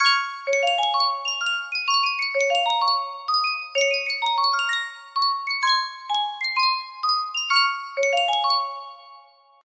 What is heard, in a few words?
Sankyo miniature music box